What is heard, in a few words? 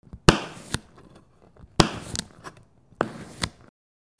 KITCHEN